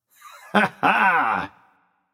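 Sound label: nonverbal heh heh; smite; voicepack; merlin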